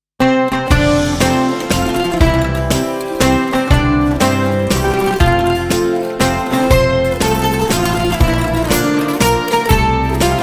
JAWA Birthday Song Happy Birthday, Happy Birthday in JAVANESE